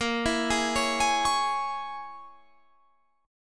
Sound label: A sharp Minor 7th Flat 5th A sharp, Guitar Half Step Down